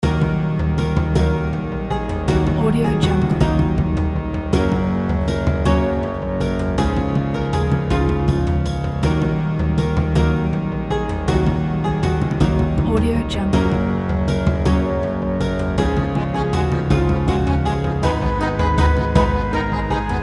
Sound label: pirate story game loop